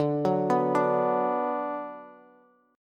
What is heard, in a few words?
D 6th D down Bass, chord; D6 arpeggiated; JGuitar